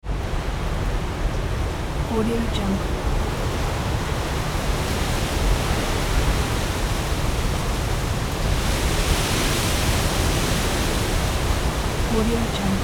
hurricane storm

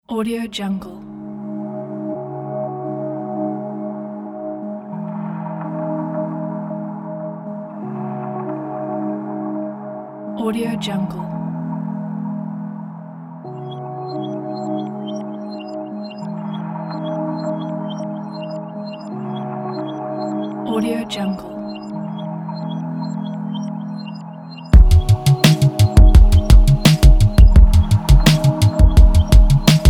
atmospheric background

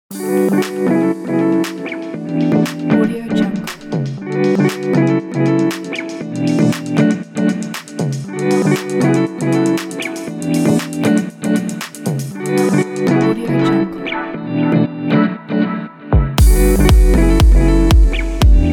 upbeat summer pop

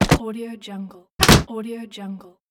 chest open and close

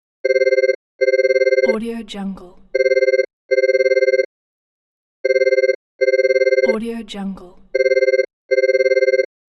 phone call

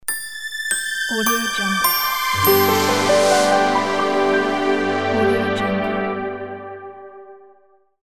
light logo; svyats

